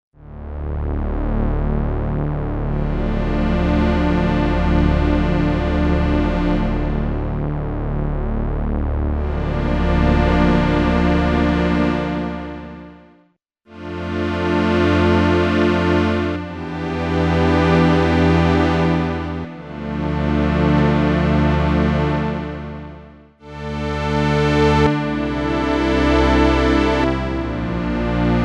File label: LOW STRINGS, Korg DW-8000; Factory Patches